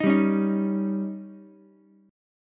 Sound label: Db Suspended 2nd Flat 5th chord, Ukulele; strummed; JGuitar